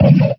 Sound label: Jungle Kick